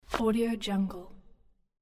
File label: insert hat